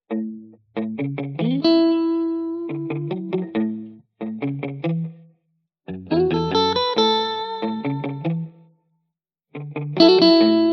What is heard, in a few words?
music home guitar